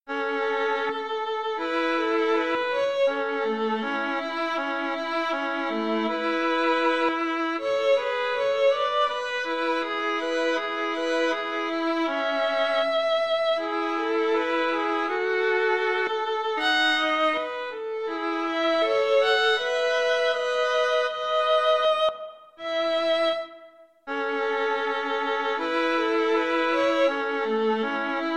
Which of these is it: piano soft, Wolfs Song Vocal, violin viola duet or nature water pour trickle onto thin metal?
violin viola duet